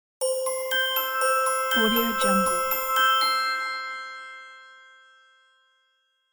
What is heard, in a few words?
happy message